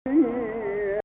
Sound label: Rast Fein Ya Gameel nahawand; Maqam Rast